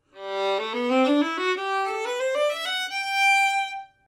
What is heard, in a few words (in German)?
akustik normal